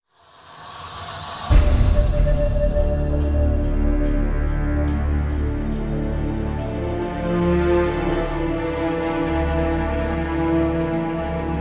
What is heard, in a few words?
Mission Failed